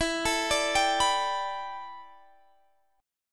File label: A 9th Suspended 4th, E